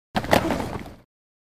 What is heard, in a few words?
Football Blk Grunt, football